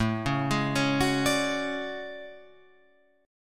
A Major 13th, down Guitar Open F; AM13; arpeggiated; JGuitar

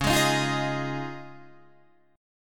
Db Major Add 9th, Guitar, Open D

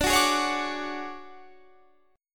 D Major 7th Flat 5th Guitar Standard, chord; strummed; JGuitar

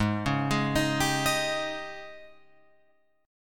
Ab Major 7th Suspended 4th Ab Guitar Open F; arpeggiated; 8,8,8,10,10,10; AbM7sus4; JGuitar

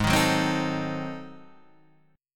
G sharp 7th Flat 9th G sharp, chord; G#7b9 Chord {4 6 4 5 4 5} strummed

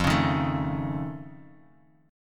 F Augmented 7th F, Bass Standard; strummed; F+7

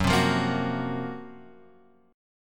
F sharp Major 7th Flat 5th; F#M7b5 Chord {2 3 3 3 X 2} strummed

